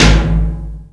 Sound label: METAL PERC, METAL P; AKAI S6000 CD-ROM - Volume 1; PERCUSSION